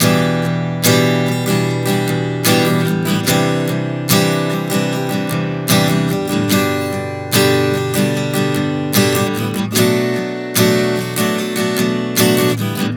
Progression 074 Em-Cadd9-G-Bm, Acoustic Guitar, Chord Progressions 074 bpm; Sonic Foundry; Songwriter Acoustic Guitar Companion